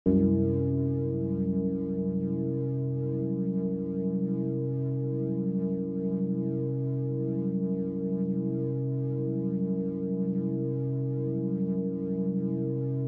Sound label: Drone: Eerie, Menacing, And Atmospheric Monotone; Drones; Sound Design; Stuart Duffield